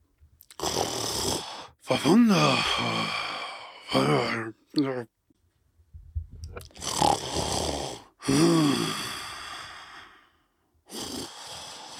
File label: sleep loop